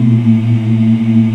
VOX AFMM, VOX Afro Mms, Africa

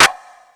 Beat Box; E-MU Pro-Cussion

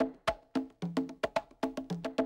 110, Classic Drum Loops

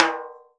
snare, timbales; Univers Sons; Basicussions

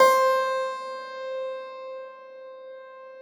piano C3, Thumb Tack Piano ff +ped; Total Piano

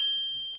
TRIANGLE; Percussion; InVision Interactive; Percussion Slam; ITCHY

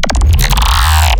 glitch stab; Virtual Riot; Glitch Stabs

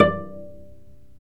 STR VC PIZ, Chamber Pizz, String Master Series

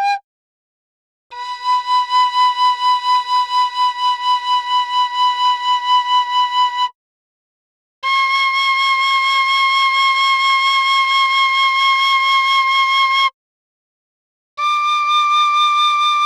Gamelan; Propeller Island - Complete Gamelan

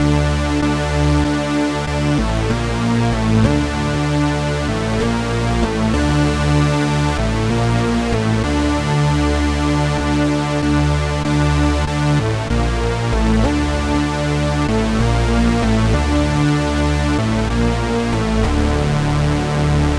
Tres Synth; Sonic Foundry (Sony Creative Software); Club Joints and Street Anthems; Toxic Rain

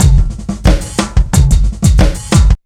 JZ 90 DRUM, ACID JAZZ

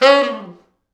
T Sax falls

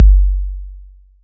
BD 808

